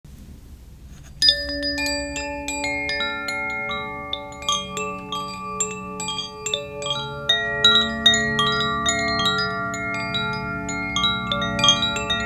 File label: Water